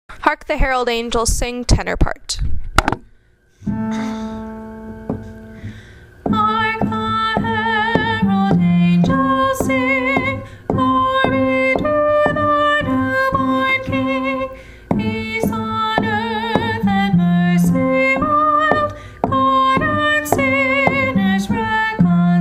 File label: hark the herald tenor, choir